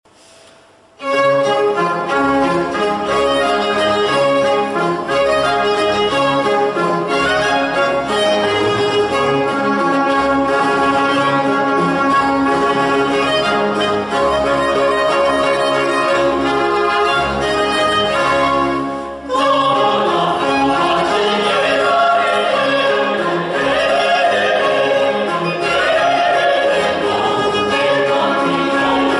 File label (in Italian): Coro